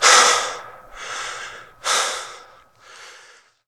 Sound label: Prisoner Catch Breath